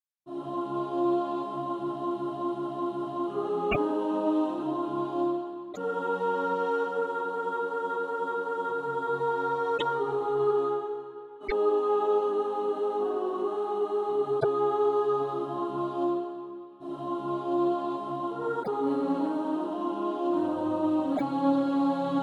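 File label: soprano, SATB Practise Music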